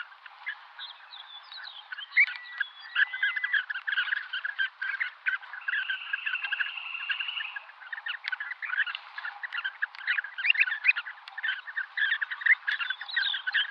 fjæreplytt beitende flokk

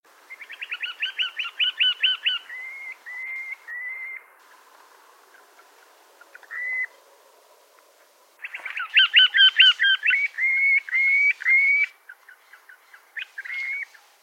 FJÆREPLYTT Display

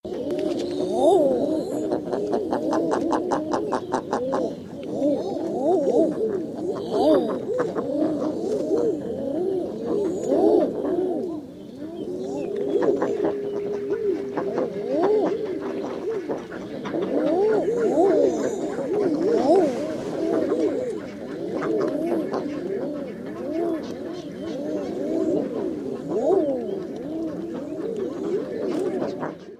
ærfugl hann hunn

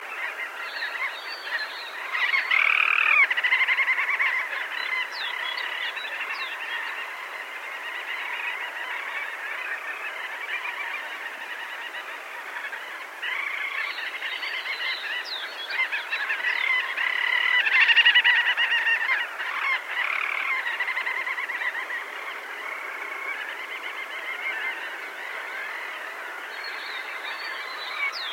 alkekonge

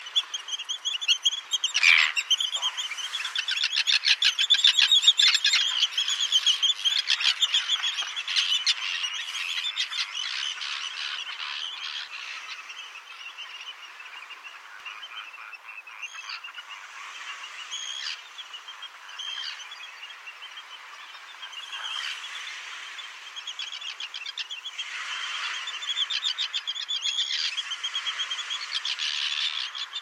rødnebbterne

